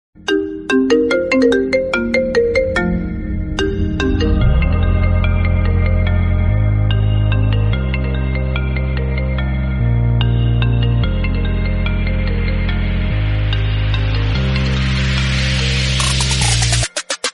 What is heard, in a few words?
iPhone 12 Mini